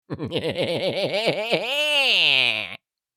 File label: Sinister laughter little fantasy creature; fantasy friends